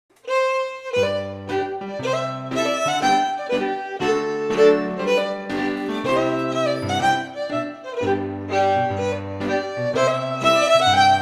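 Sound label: Strathspey, Scottish Fiddling; Macglashan col 1796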